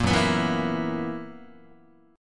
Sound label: Gb 11th, Guitar; Gb11 strummed; JGuitar